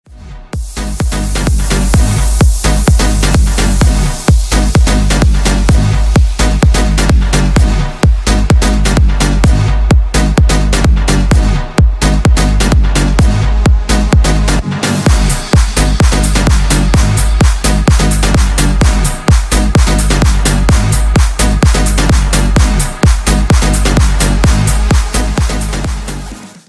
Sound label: Funky Beat